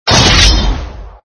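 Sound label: SFX sound (scythe hit); Red Baron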